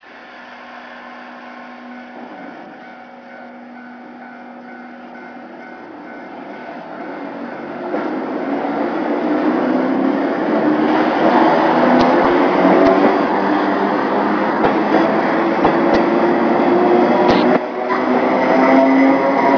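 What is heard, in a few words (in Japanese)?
京成電鉄モニ10形 市川真間駅出発時の走行音; 市川真間駅出発時の走行音（1999年2月7日収録）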